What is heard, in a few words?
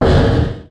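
Collision Interior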